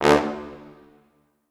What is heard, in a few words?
Orchestral Brass